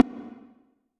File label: Faint Object, Percussive; Ableton; Glitch and Wash